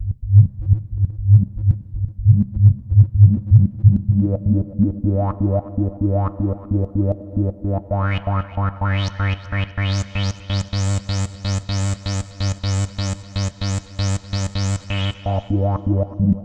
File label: Yamaha CS1x filtered riffs